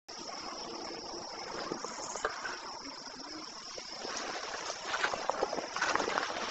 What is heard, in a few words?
knock, voices, unexplained; Goodrich Cemetery